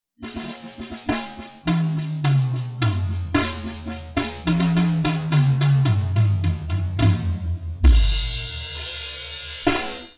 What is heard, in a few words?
drumsolo